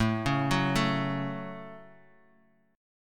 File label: A 6th, guitar; x,x,7,6,6,4,x,x